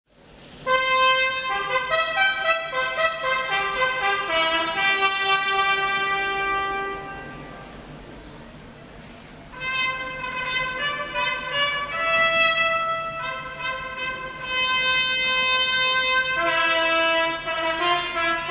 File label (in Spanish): marcha nupcial organo trompeta; mendelsohnn; bodas